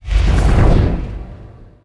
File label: morph; terrorblade